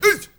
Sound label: HEY HEY, MALE NOISE, Heart of Africa